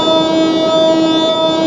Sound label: WEIRD BELL; WORS STATION; DANCE #3